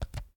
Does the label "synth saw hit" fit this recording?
No